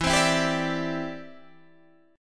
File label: C-Major Add 9th E, guitar